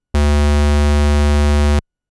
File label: Sub D2; fleet keys Samples